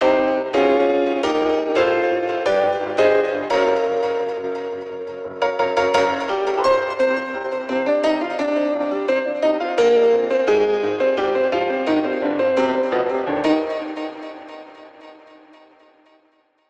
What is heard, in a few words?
boss downed head 172bpm